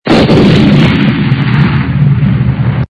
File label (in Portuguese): Explosao; Vinhetas